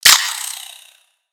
Spider Die; Lego; creatures mechanical

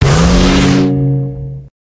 Bouncers

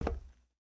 Foot Steel Boot Walk, footsteps

